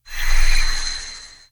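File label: ancient sword, attack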